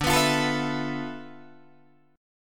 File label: D Minor 6th Add 9th, Guitar, DADGAD